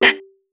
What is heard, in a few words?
Snares, Alesis Performance Pad